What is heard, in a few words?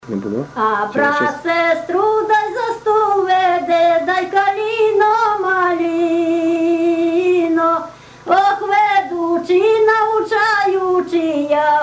pisnja A brat sestru za stol vede vesil na